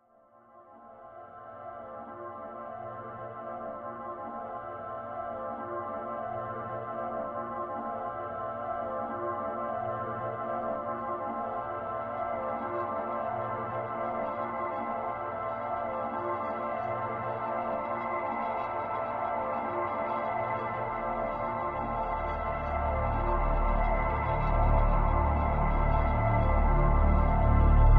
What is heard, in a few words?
Dark Mysterious Ambiance; Sherlock Holmes The Devil's Daughter